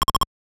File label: health pickup large; metroid